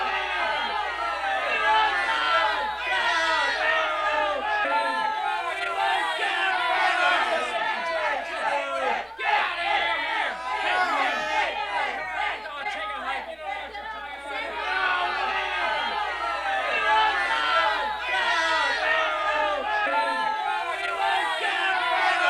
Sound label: Angry Men Shout Chant; Poetry Project